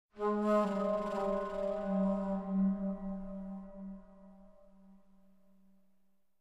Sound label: pipe; Myst III Exile